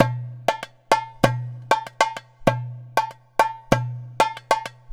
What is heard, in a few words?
Clay Dumbek, Ethnic Percussion; Sonic Foundry (Sony Creative Software); Ambient Atmospheres & Rhythms